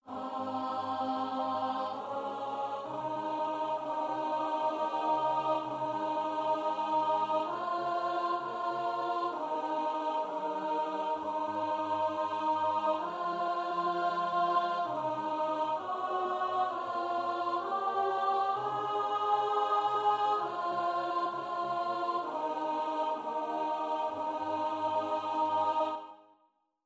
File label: Psalm alto